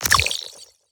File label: ui active cost